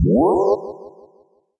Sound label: cells recharge